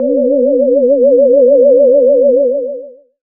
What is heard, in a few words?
synth b, Synth Atmos (pad); Chillout